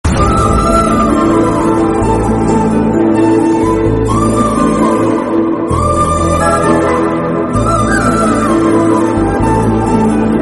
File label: flute